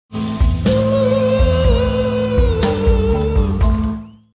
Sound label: uhh